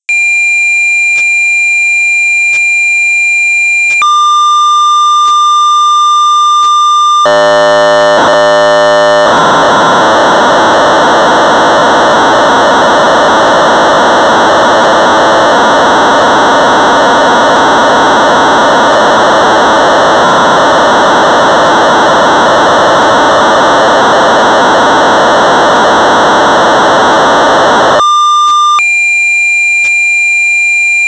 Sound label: Sorcerer 1200baud; Curve-Plotting; Daniel Wong; Electronics Aust. Feb pp.92; BASIC